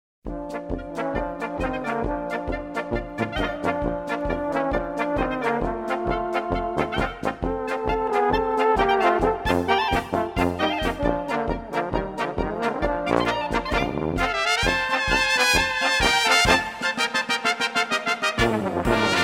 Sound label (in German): Blaskapelle Instrumental